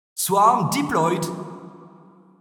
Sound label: Drone Swarm